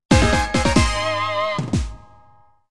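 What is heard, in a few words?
Battle Fanfare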